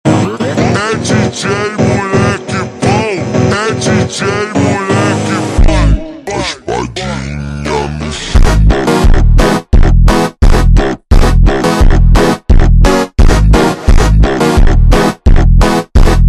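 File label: ultra Slowed, phonk music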